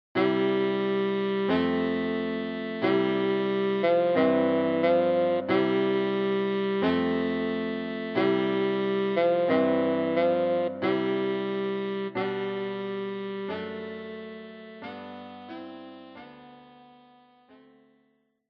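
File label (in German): Tenorsaxophon; O du fröhliche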